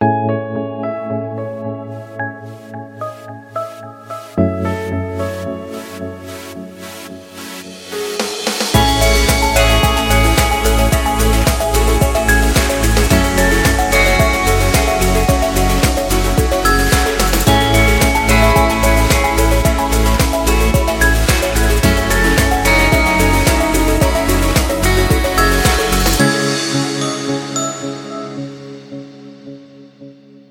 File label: Ambient Inspiration; MIUI12